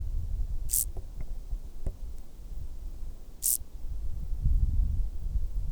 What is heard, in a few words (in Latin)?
Chorthippus brunneus